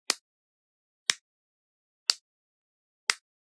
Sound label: Generic Click Wood Tiny; boom library; The Interface